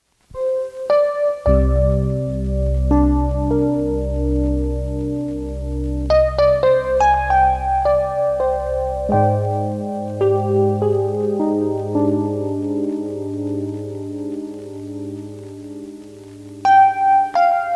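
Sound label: calm electric piano piece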